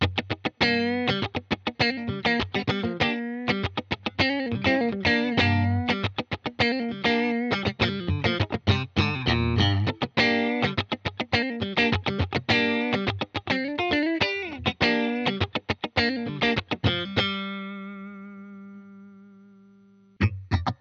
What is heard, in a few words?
Clean Bridge Middle, guitares; Muse kit micros HepCat série L configuration câblage Memphis position chevalet+milieu